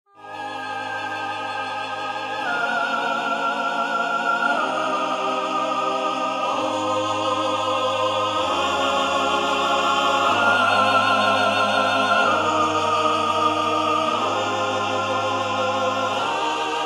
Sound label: counterpoint; Artinfuser Counterpoint